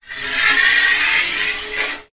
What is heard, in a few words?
metal scrape pole slide; foley